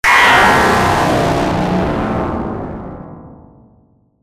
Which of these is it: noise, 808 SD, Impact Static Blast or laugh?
Impact Static Blast